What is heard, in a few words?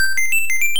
1UP Jingle; SMBS; Sharp X1